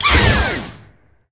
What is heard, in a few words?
Homingbird Activate